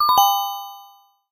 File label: emoji ding dong